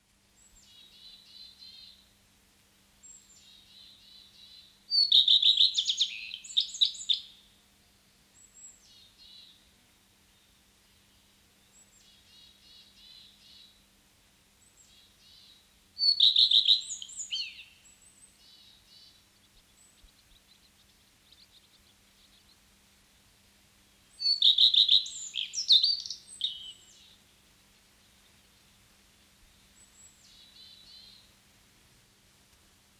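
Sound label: common redstart voice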